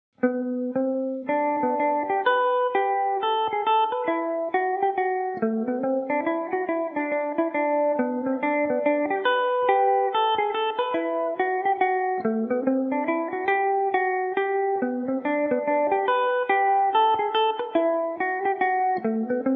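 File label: connollys barndance G major revelation, mandolin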